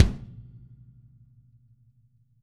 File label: kick